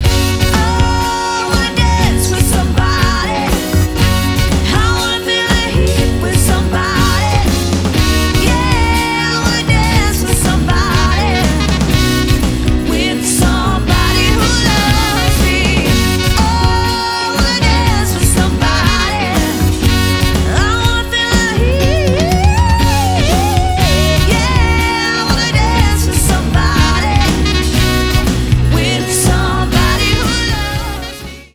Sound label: 6-piece (keys sax)